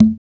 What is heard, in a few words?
CONGO, African Perc; Spectrasonics; Supreme Beats; African Contemporary; SB Afro DRMs